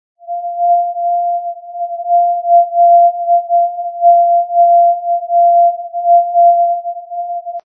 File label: ndb saitu rokku